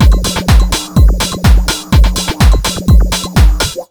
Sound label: 125BEAT, Disco House; USB Soundscan vol.20; Fresh Disco House I; AKAI